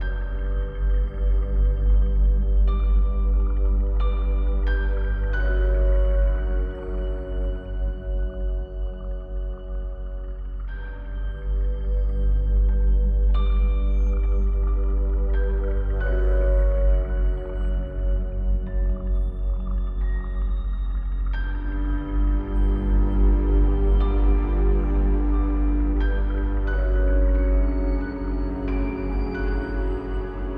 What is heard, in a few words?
Forest Cave